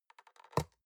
box small open soft; industrie wirtschaft; bueroarbeit

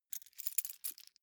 key ring large rattle soft; objekte; schluessel

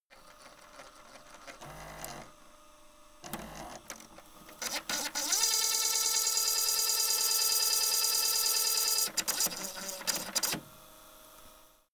servo move; objekte; elektronik

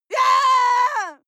female lunatic scream attack long; menschen; schreie

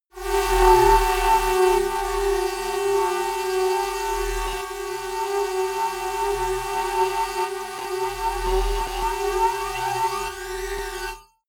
disturbing phaser; flaechen

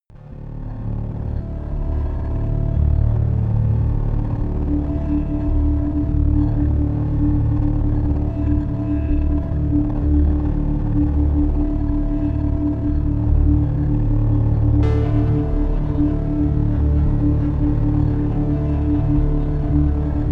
shivering, music elements

